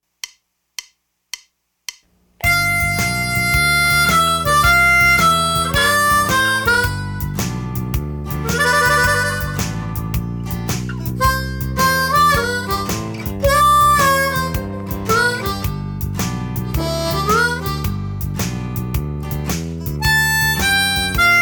Basic Blues Fast

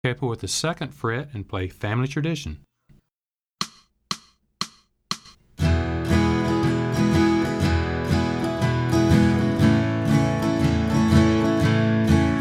Capo At 2nd Fret

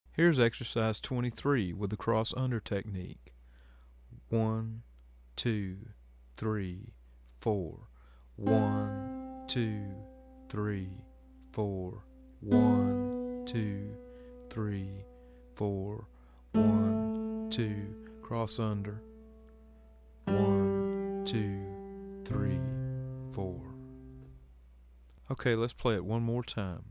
Bass Line

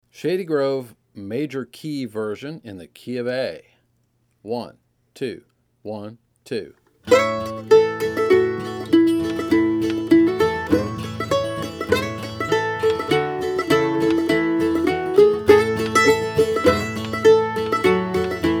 Shady Grove Key Of A

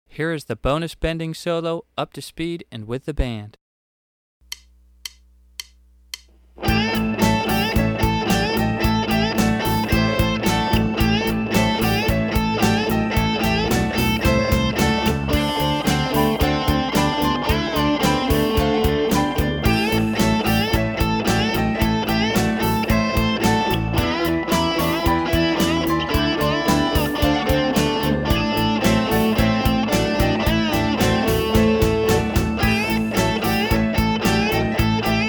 Bonus Bending Solo Fast

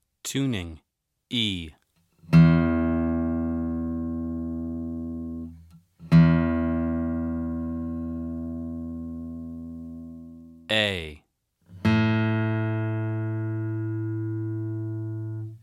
Tuning The Guitar

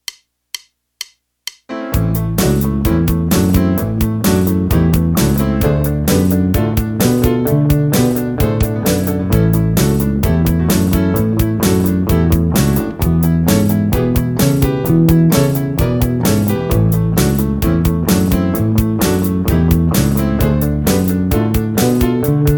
Walking Up The Neck Fast

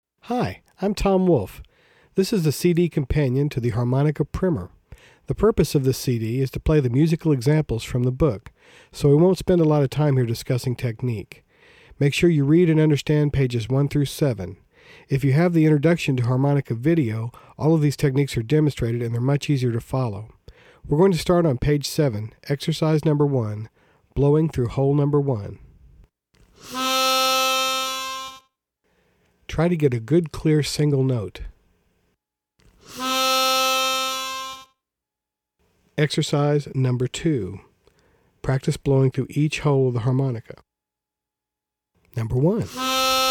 Blowing

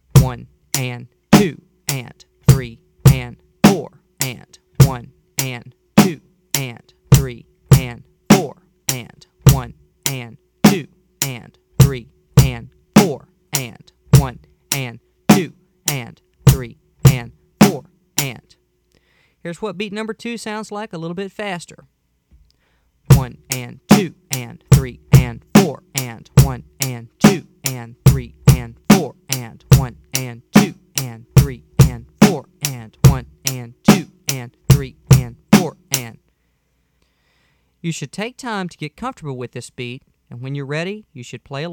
Beat 2 With Snare

